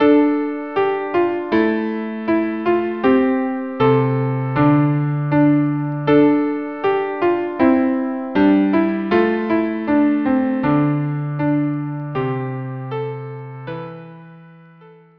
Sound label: violin cello duo